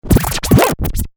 Turntable Scratching; DJ (Sound Effects:Musical)

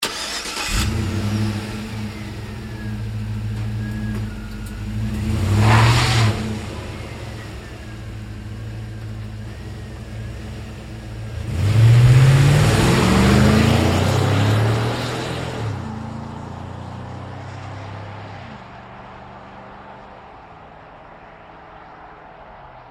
Truck GMC Savana Start Away Fast Edgy; Vehicles

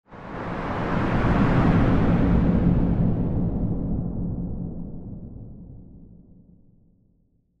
Sci Fi Spaceship; Spaceship Whoosh Large; Spacecraft

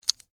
Legos Drop Move, Together; Foley; Drops (Sound Effects:Objects)

